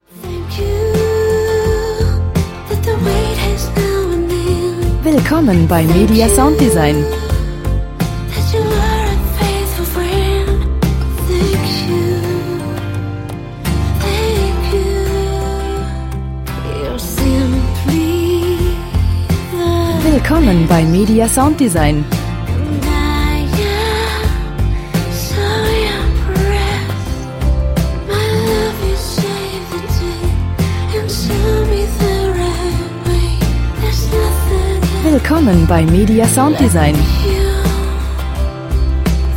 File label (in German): gesang